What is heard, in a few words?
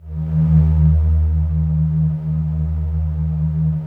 Matrix 12 choir Eb, vintage keyboards; Big Fish Audio - First Call Vintage Keyboards